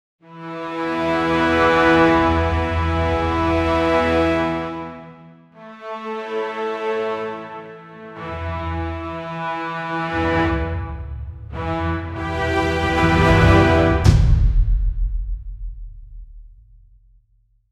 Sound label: epic cinematic score with